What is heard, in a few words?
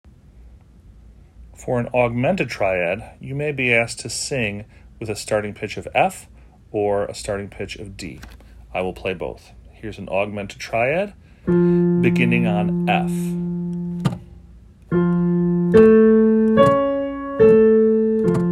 Augmented Triad starting on F and D